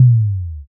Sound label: tom